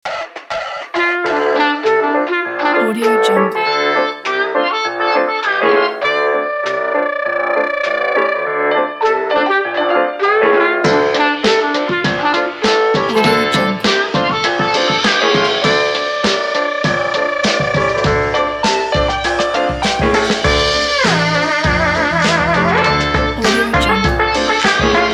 coffee jazz retro swing

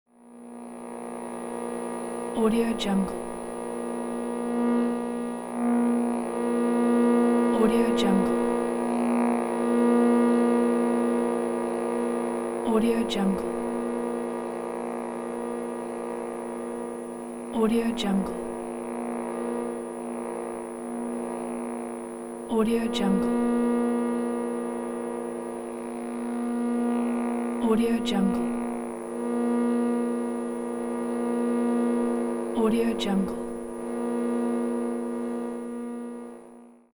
electromagnetic field; sounddream

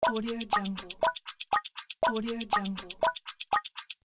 countdown

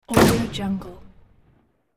hand gun shot with silencer

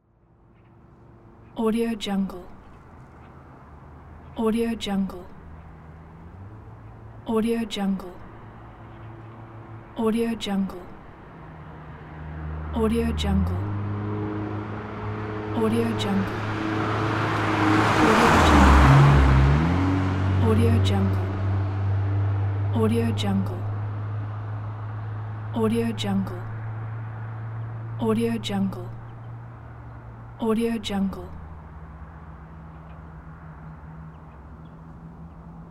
car passing

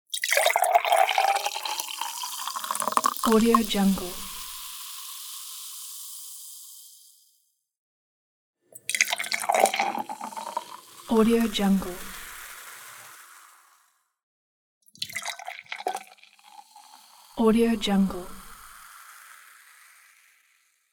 pouring soda

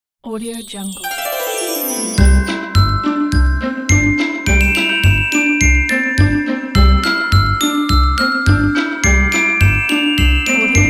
christmas bells ambient magic pack

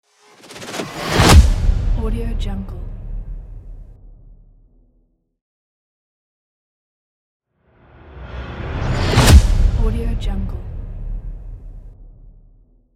futuristic impact

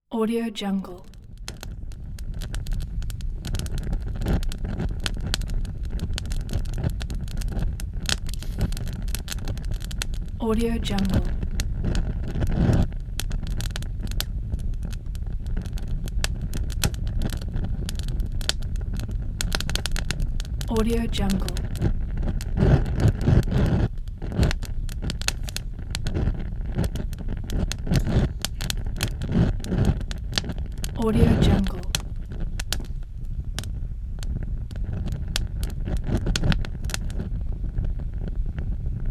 bonfire

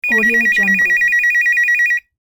phone ring

